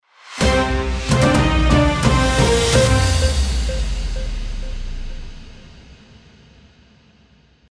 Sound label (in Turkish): TV HABER JENERIK; BENGÜTÜRK TV HABER JENERİK